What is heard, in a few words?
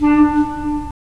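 wind clarinet; Roland - LA Composer vol. 3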